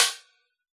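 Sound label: snare drum Jungle, Yamaha DJX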